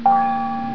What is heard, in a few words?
New Mail